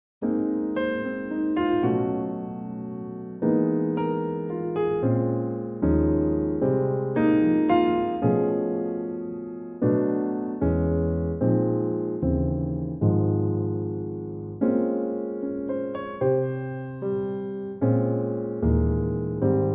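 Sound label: Ab piano track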